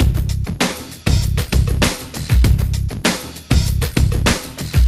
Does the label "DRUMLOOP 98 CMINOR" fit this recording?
Yes